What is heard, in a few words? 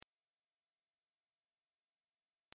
mosquito repellent 20000; dengue